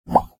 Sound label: plopp